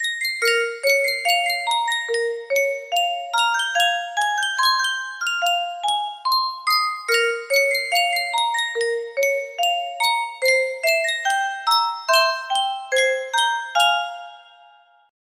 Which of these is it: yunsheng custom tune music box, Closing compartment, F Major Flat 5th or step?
yunsheng custom tune music box